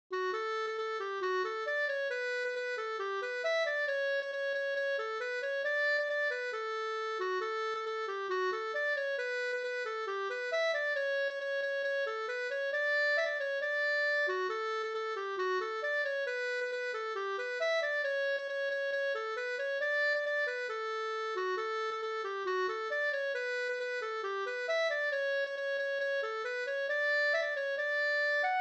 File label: polka